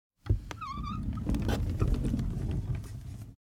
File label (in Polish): armata jedzie do przodu